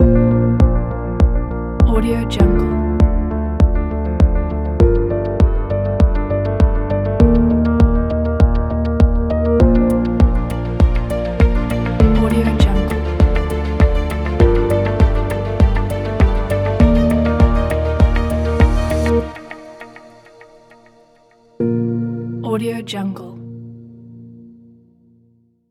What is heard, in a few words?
corporate pop ident